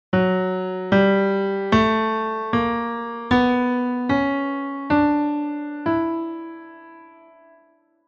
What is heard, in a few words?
scale starting on f sharp